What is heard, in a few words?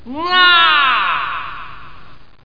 Crash